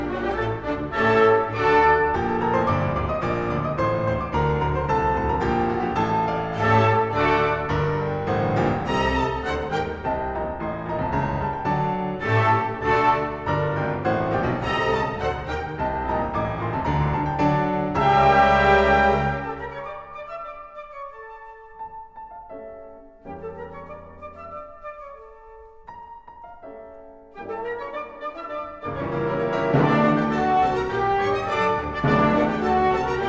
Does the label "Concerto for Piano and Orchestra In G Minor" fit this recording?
Yes